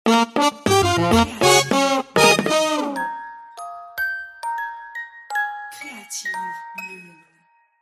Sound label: Trumpets and Calm cuivres